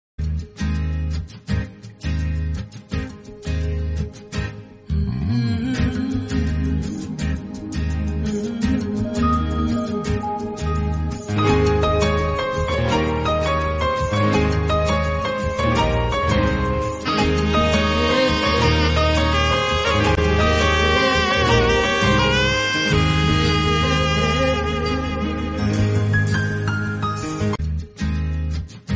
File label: Marathi Instrumental Ringtone